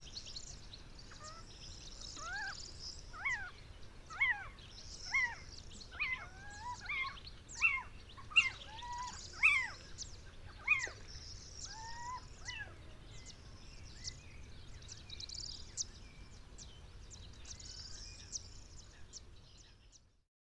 Cucumiau Excitement calls aggressive song Morocco

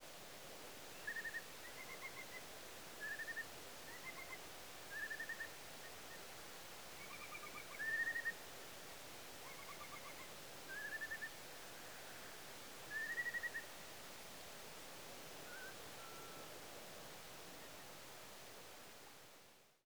Whimbrel

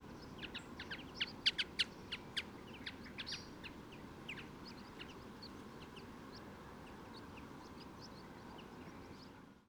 Brambling Flight Calls During Migration

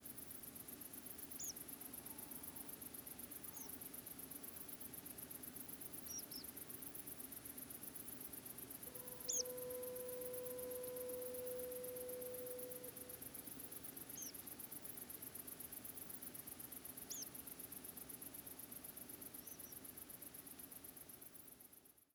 Tree Pipit multiple calls, Portland

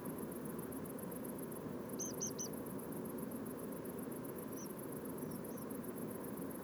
Tree Pipits; Portland

